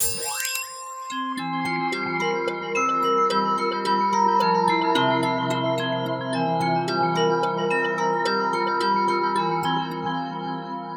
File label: Focus, ringtones; Honor; 600 Pro China